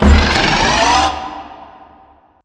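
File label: Assembly Plate Lift Up Rock Distant; Boomtown; Titanfall 2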